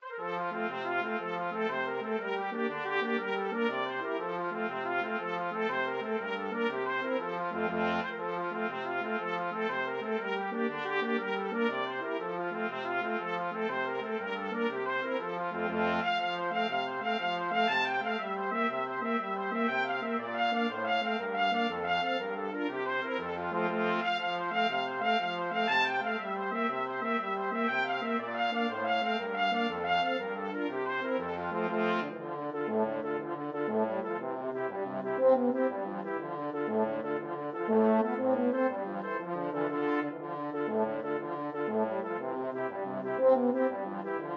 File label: irish jig medley, flexible brass ensemble 4 players